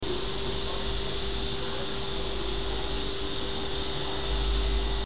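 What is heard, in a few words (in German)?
rasensprenger, lawn sprinkler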